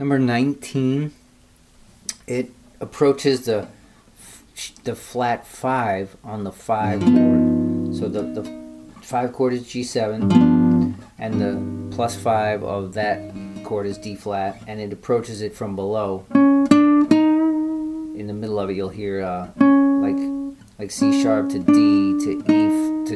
jazz licks

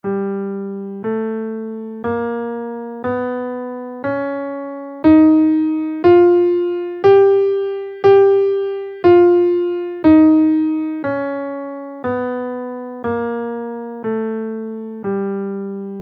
half diminished b4 scale in Gm, guitar

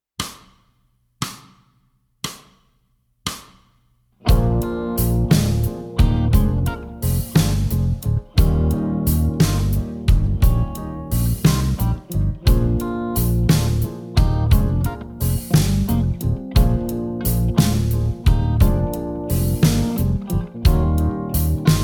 lowdown blues

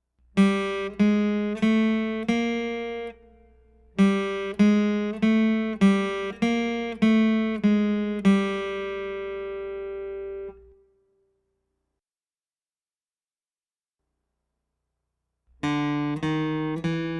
Sharps 3rd 4th String

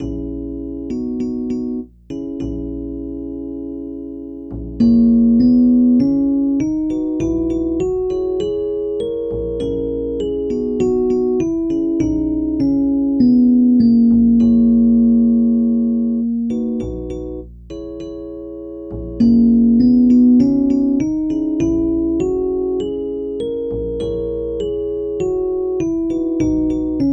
locrian

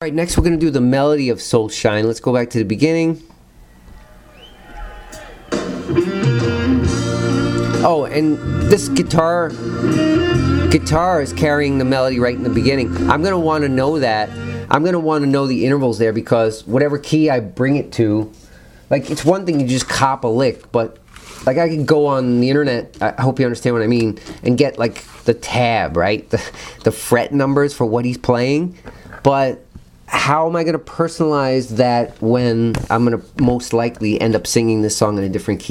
guitar melody; ear training

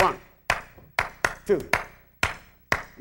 subdivisions of 2 beats loop

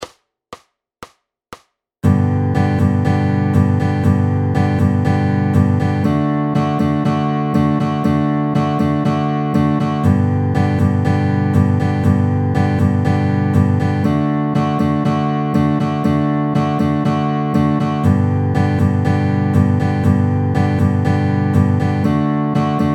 G to D 120 bpm

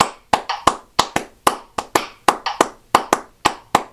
reversed clapped; 12 claves

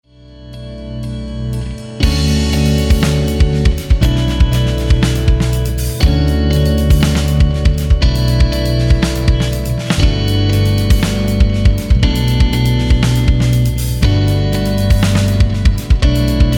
lick backing

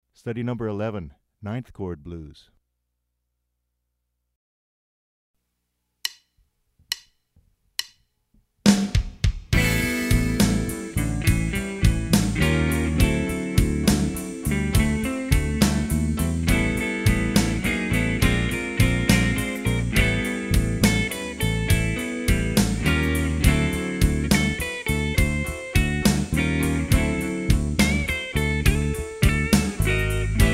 Ninth Chord Blues

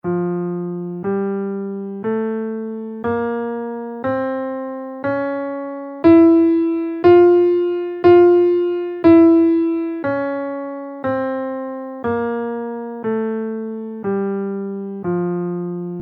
harmonic major scale in F

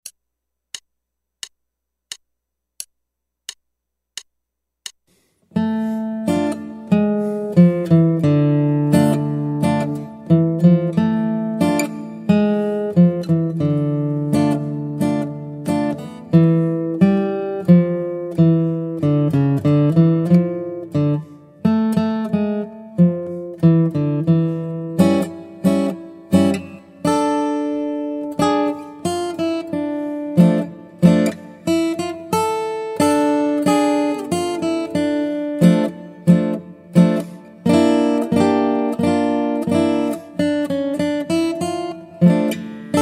Guitar

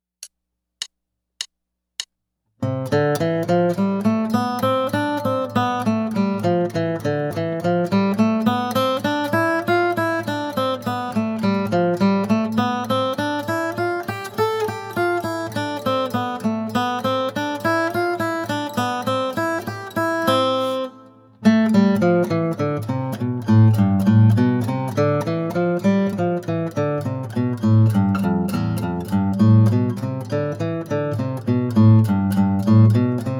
A Daily Scale Study

